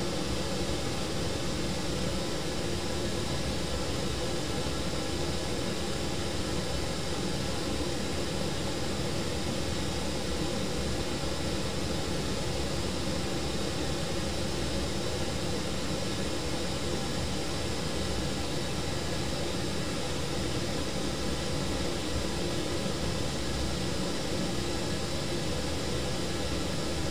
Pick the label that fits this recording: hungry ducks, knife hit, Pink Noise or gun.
Pink Noise